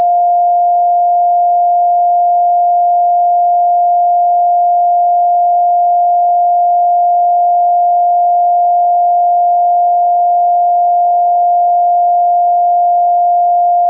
meteosat 10 ttc 2242mhz500 cw

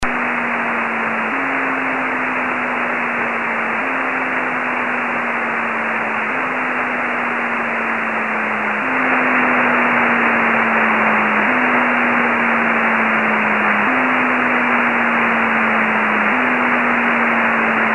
beacon

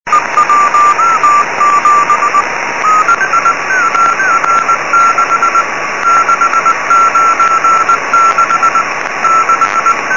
beacon

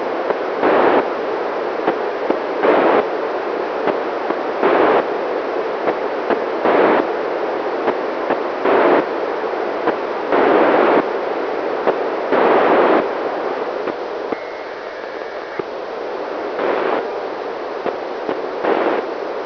1k2 9k6; fastrac-1

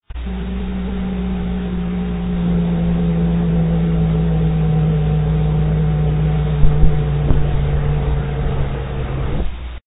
tracking transmitter; project score

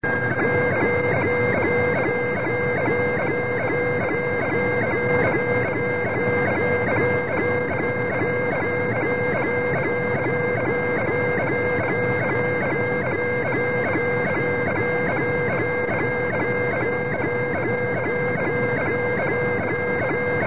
am beacon

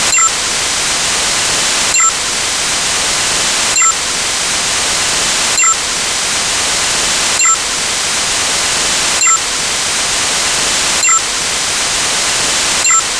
COSMOS2438 multi bursts

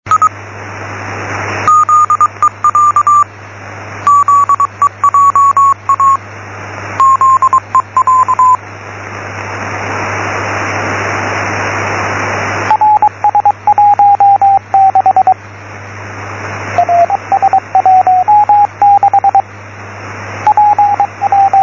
beacon

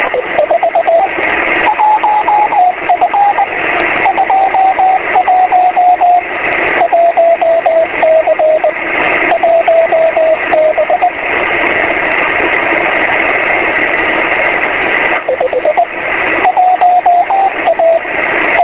FitSat 437mhz2589

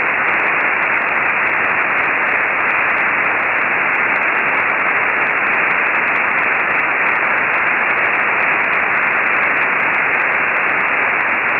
gmsk beacon; xw-2b; 145mhz705

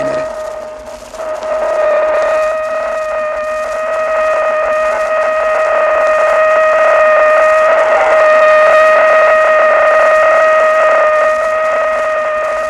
Mariner

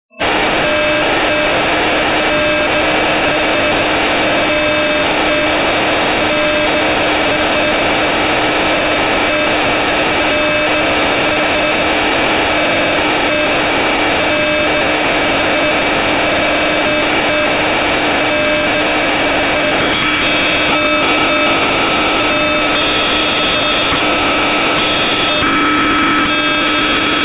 gps usb; SDR-RADIO

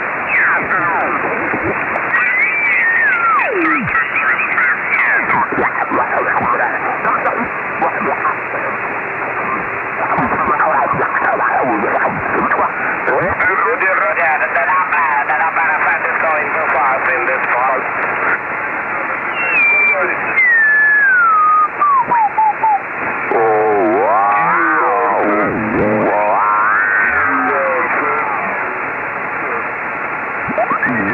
linear transponder 145mhz990; xw-2f

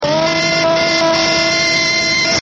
uranus radio wave emissions

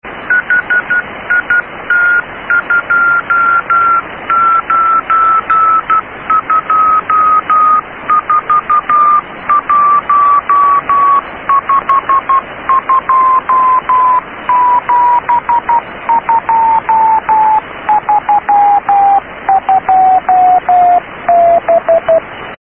hitsat hit2

